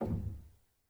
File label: rel, Grand Piano; bitKlavier Grand; Piano Bar